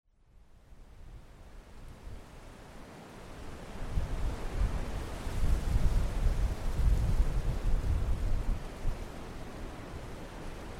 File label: Countryside Walk Soundscape